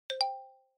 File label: Xylophone Multi